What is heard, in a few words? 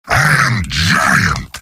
cheers; heavy; video games; source engine; tf; mvm